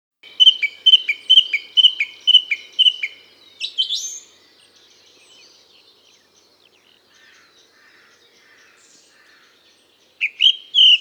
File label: Song Thrush, birdsong